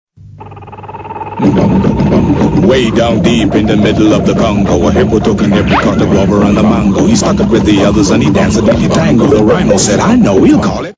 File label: Jungle Jingle, Advertising Jingles; Um Bongo